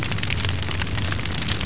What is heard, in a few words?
fire; zrush